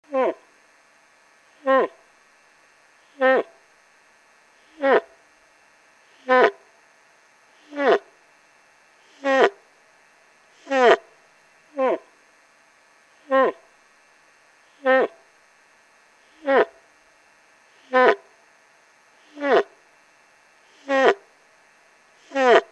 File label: Young Bull In Love Hound Dogging, Moose; Dave Kelso